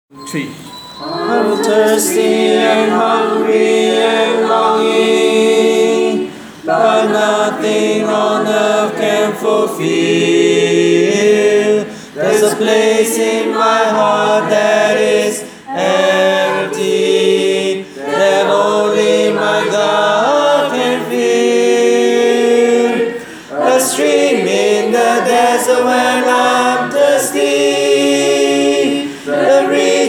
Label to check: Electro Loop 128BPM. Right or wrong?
Wrong